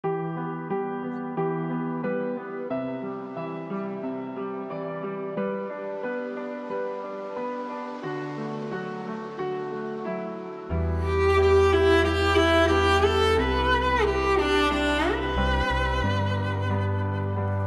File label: cello